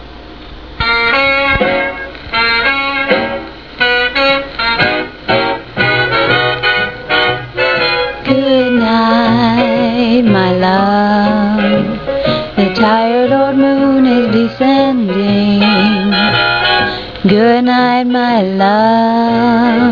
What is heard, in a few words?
slow swing